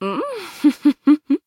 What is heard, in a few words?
laugh; MissFortune; jp